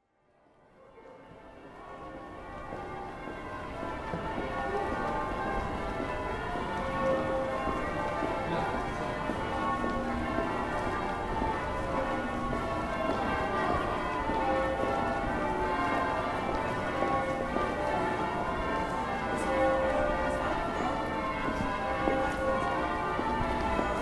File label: bell ringing